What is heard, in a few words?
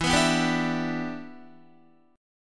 E Minor 7th, Guitar; strummed; 11,14,11,12,14,12; 4F,4A,5C,5G,5C,6E; Em7